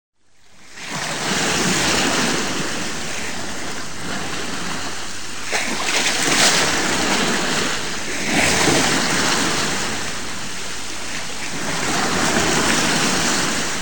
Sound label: radio reverb